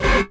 dance chord

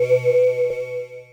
Bells

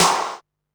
clap, percussion

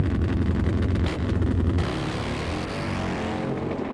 Motor Bike

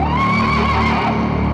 Synth Horse

